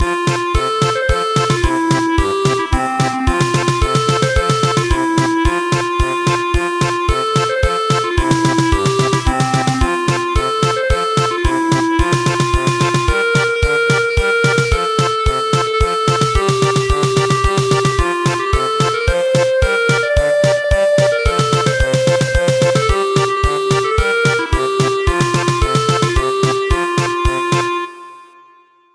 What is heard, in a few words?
German Polka; Hooray For You Who Turn Your Year